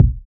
smooth kick single hit g sharp key, Royality free kick single hit tuned to the G# note; kick drum samples